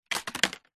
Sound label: leaf dry drop fall on others; zapsplat